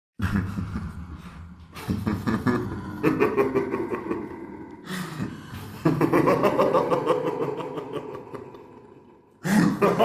evil laugh horror sound effect

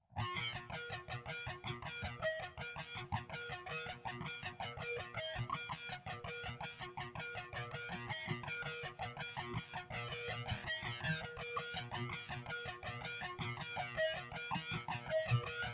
guitar play

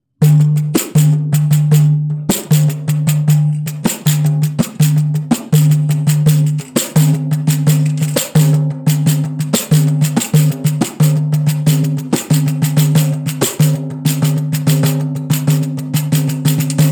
instrumental speed 120 bpm

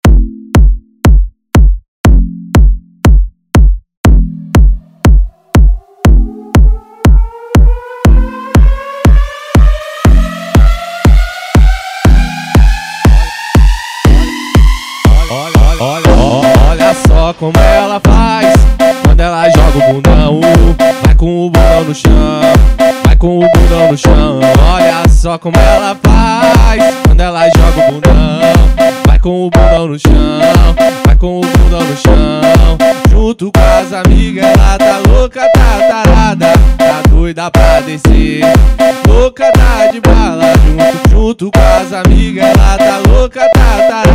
rave funk

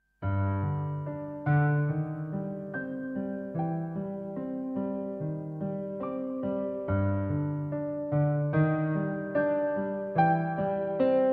piano cover; silent hill

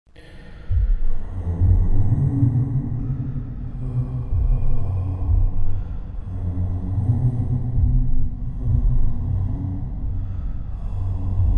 creepy horror man sound effects

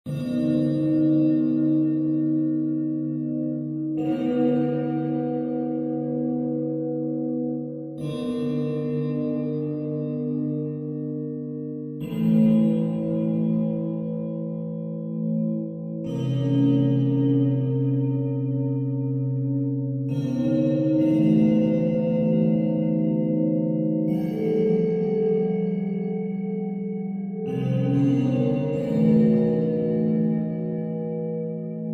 creepy broken church bells horror sounds

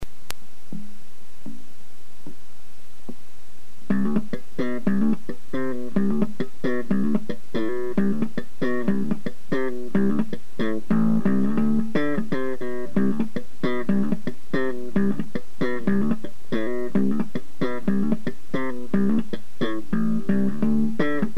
slap

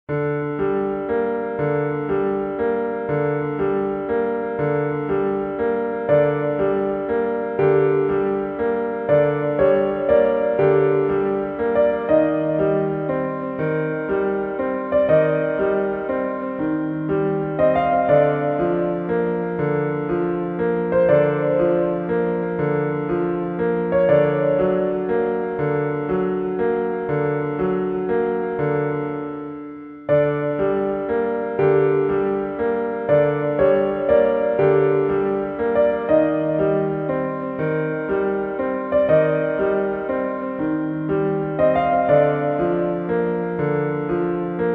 piano version; silent hill shattered memories

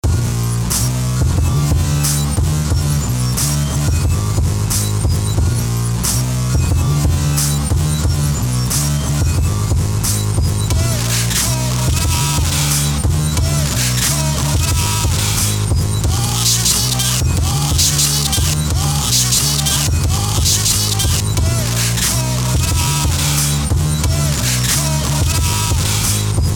remix

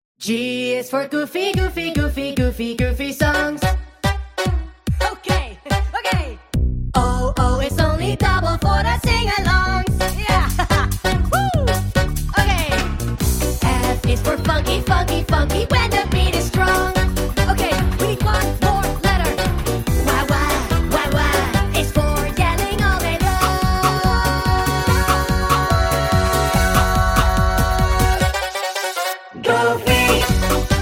goofy song sped up horror

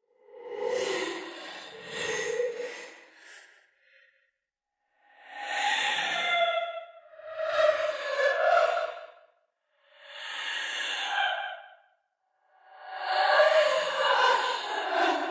scary ghost woman crying horror sound effect

